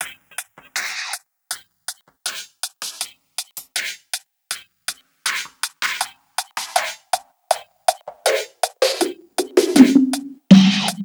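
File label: Loop, beats; Sonic Foundry (Sony Creative Software); Esoterik Beatz; Quesy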